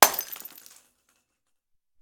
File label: chemical Reactor Overheated; voxelverse